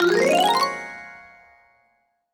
big win popup; Skillzgaming; fruit Blast Desktop

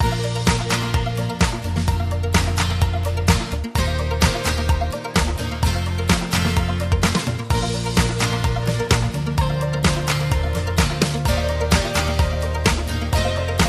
Big Win Music Tally; sugar Parade Desktop; Mahi Gaming

